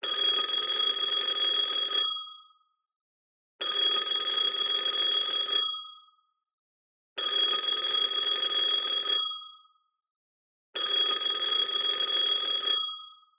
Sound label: household telephone old bell type ring us; zapsplat